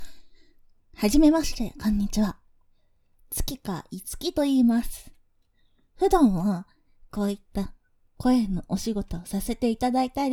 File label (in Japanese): ボーイッシュで可愛い女の子がペロペロローターでクリイキしまくり; 月桂樹